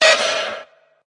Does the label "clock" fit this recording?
Yes